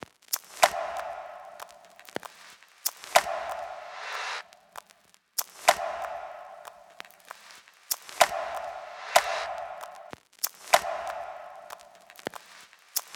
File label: 95 Loveless With Snares